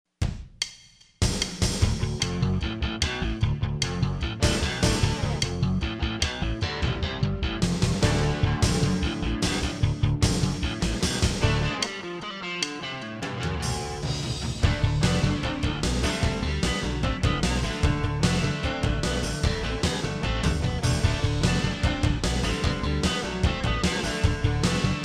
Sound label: country